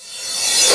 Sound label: reverse